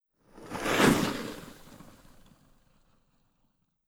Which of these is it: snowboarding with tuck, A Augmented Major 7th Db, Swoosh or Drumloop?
snowboarding with tuck